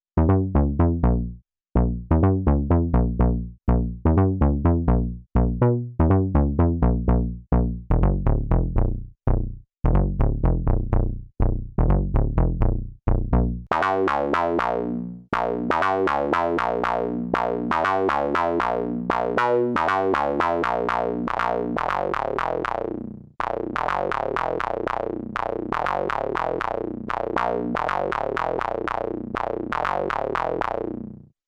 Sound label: BASS BANDPASSED